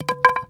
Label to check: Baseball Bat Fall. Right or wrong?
Right